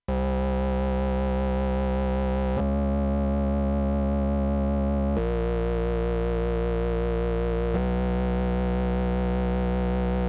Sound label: 140 bpm Bass; remix fragments